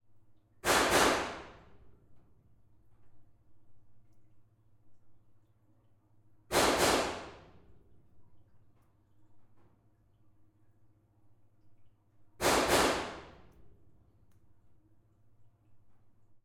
ppk cafeteria suppressor double shots blanks small stoneroom nextdoor; sonniss gdc